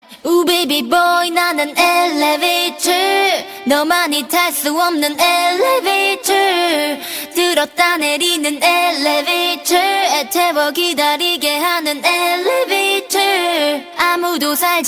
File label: Elevator